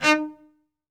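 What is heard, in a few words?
solo cello, strings; ILIO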